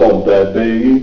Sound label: PUMPBEAT